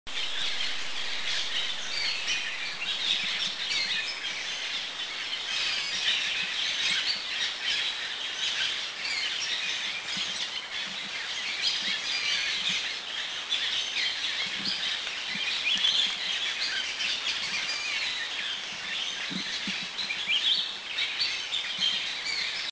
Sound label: Black Bulbuls, Thailand; Doi Inthanon